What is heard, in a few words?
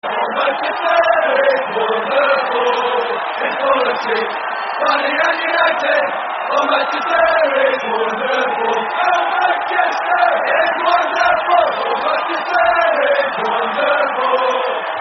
Oh Manchester (With Crowd), terrace chants; Man United